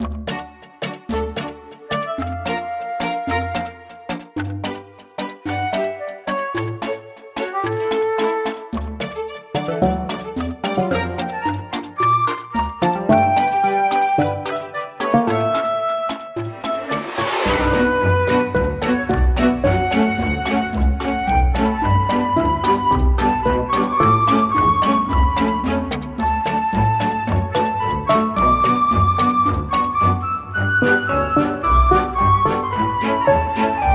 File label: music Game; guodongrenwu